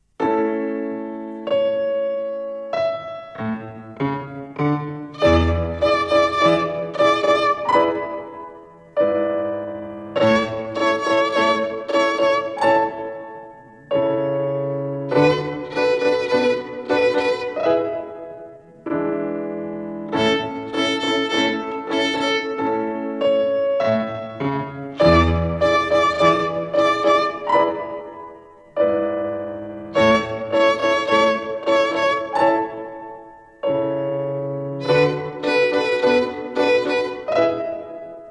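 Rhythm with Three Fingers Third Twinkle Rhythm, violin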